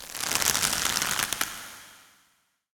ambient game movement climb vine stretch rope tree crack pull